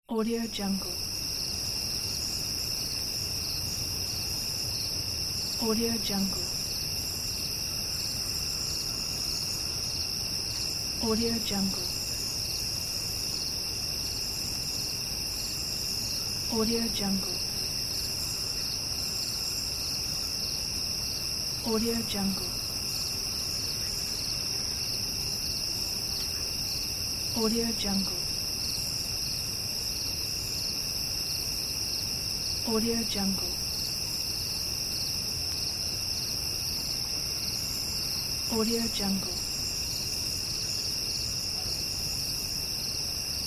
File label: night crickets